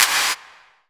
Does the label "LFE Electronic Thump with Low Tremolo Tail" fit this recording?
No